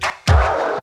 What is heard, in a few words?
SCRCH; ZERO-G; Datafile